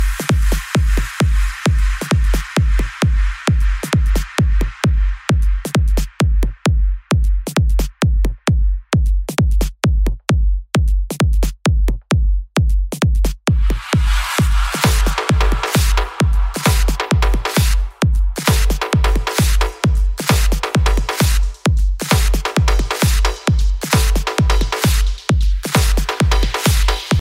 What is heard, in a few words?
english dj music ringtone